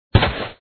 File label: Ground; Dad 'n Me